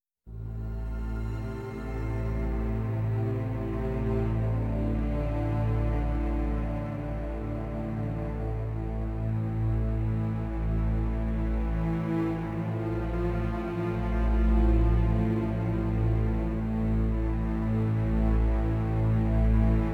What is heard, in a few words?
drone